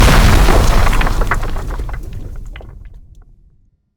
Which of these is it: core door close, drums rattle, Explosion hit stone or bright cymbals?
Explosion hit stone